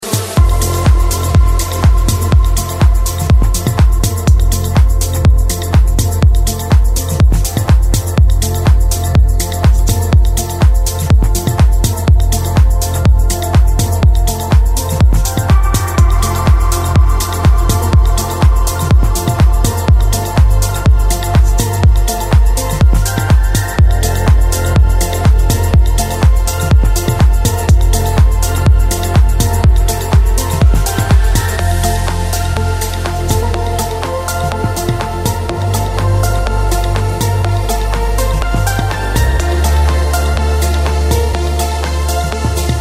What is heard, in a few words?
progressive melodic house